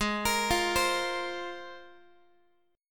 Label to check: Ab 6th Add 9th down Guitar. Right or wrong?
Right